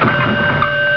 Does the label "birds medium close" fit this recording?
No